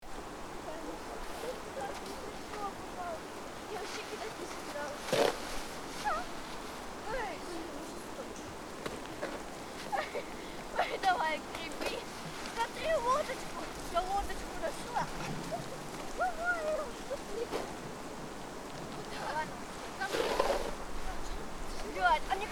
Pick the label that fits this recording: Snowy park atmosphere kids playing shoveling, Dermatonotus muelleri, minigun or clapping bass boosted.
Snowy park atmosphere kids playing shoveling